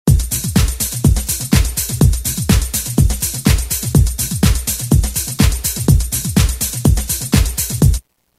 Mover 124; Roland M-DC1

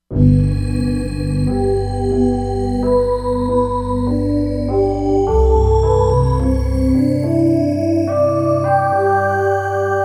Strang Chor, Korg MSC-07S Synth; Korg MSC-07S Synth 2

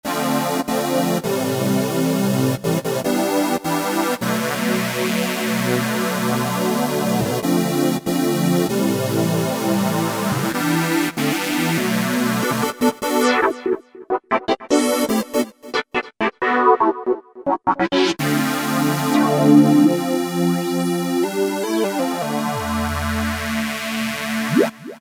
Korg microKORG 4 OSC m7 Chord; Factory Voices

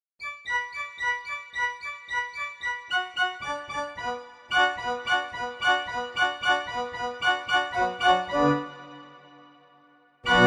GAMBE, Church Organs; Universal Sound Bank Samploscopie Classic Vol. 1

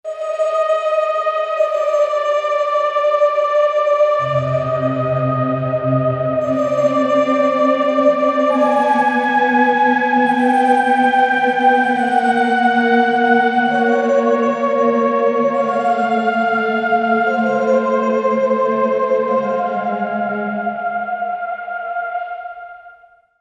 Arctic Vox, synth

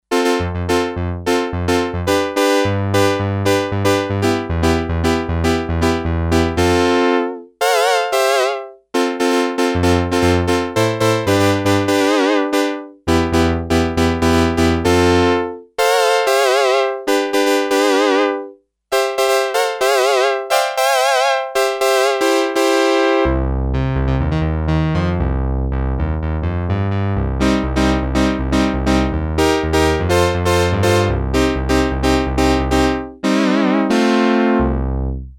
Synth B, Sequential Circuits Six-Trak; Factory Programs

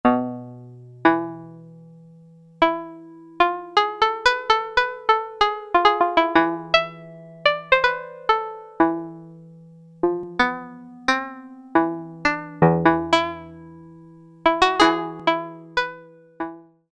KOTO, Ensoniq ESQ-1

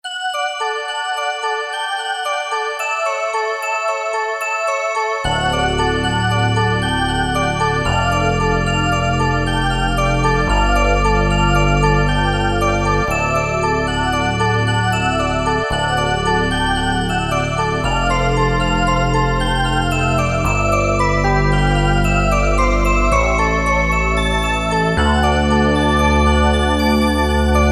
SynB BellPad, Roland U-220; Factory Patches

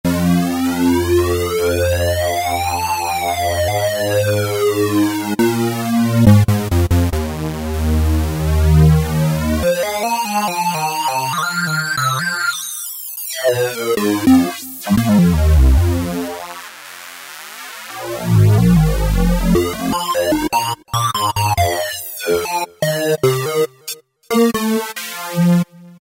Sweep Sync Lead, Korg microKORG; Factory Voices

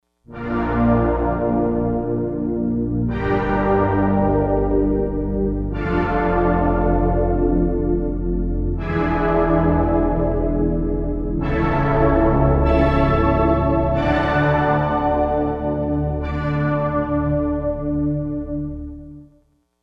Chime Mellow; Digital Synthesizer

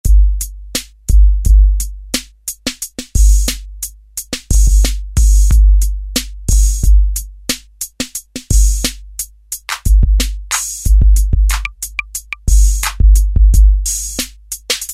Straight 8; Factory Preset examples

